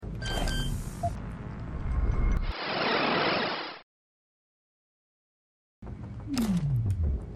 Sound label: hacking music